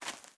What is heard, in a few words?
land